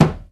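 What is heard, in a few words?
muted hand Timbales; E-MU Producer Series Vol. 2 – More Studio Essentials; Composer; Timbales Xswitch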